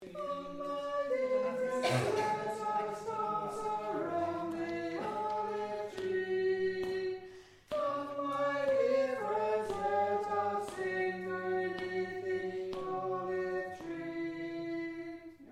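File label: Tenor Chorus Slow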